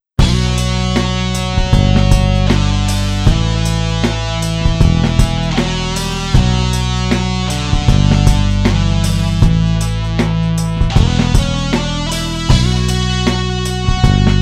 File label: patch demo